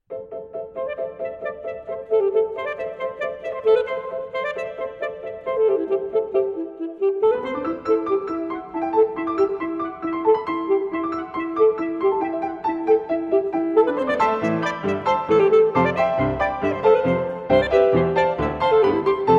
Studies in English Folk-Song Allegro vivace

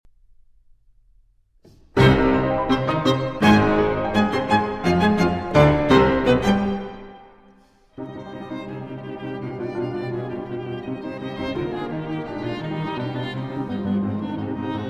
Piano Trio No. 3 in C Minor, Finale. Prestissimo

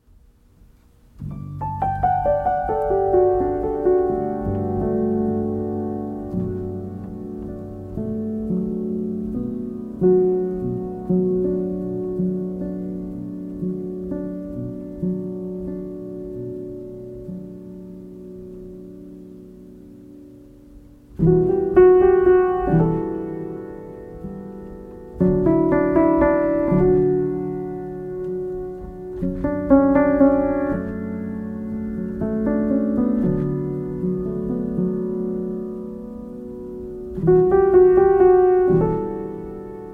Solo Piano

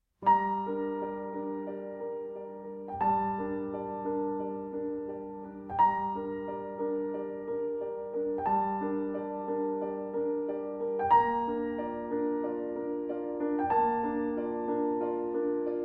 Solo Piano; From Good Bye Lenin!

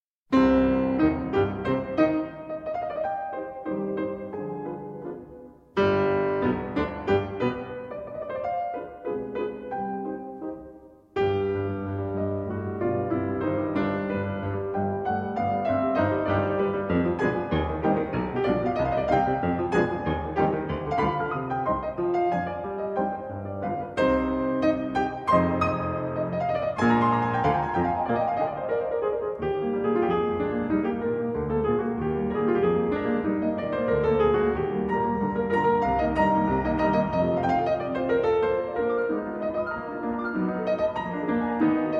Piano Sonata in C Minor, 2 Pianos; No. 14; Arr. For 2 Pianos Byy Edvard Grieg

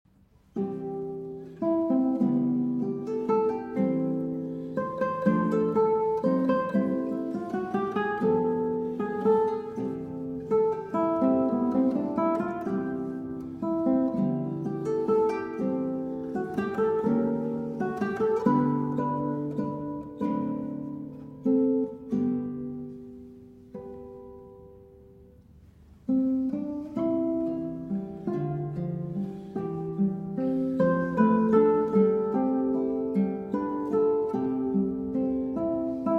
Version For 2 Guitars; Mélancolie, Op. 23

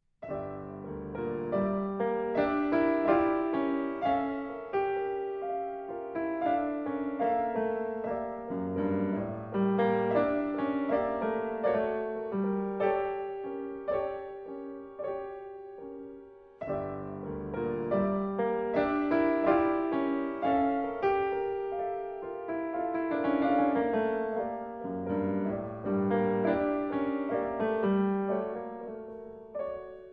Nocturne For Piano Solo; Benabdeljalil

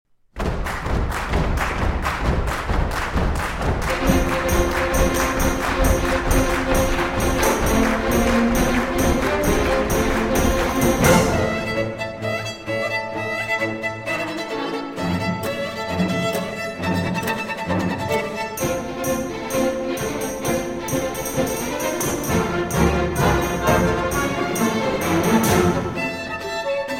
Violin Concerto in D Major; 4. Hootenanny

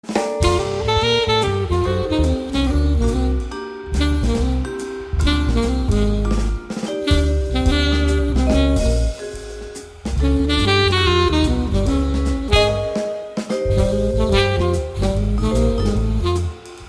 Quartett Jazz Alto Saxophone Swing

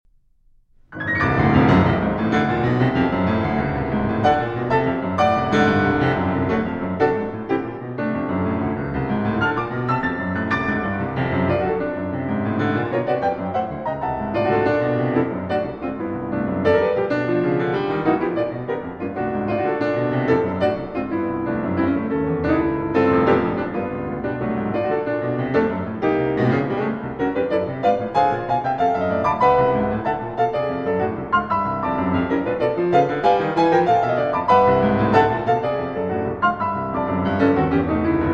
Concert Etude, Raillary Vivace; Eight Concert Etudes, Op. 40 V.

